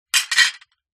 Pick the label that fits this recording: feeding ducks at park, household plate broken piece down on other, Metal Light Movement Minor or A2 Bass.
household plate broken piece down on other